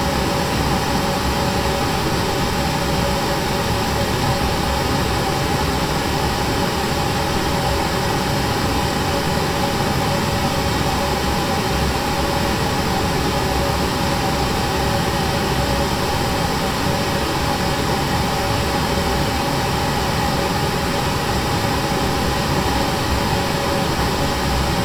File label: Pink Noise; Corso acustica